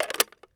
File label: rem700 mag in; Escape from Tarkov